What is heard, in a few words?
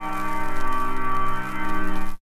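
organ stab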